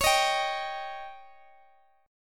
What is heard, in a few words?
D sharp 7th; x,x,x,18,16,15